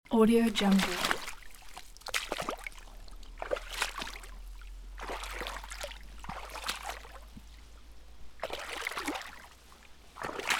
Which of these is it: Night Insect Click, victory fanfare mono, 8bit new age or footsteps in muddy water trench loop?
footsteps in muddy water trench loop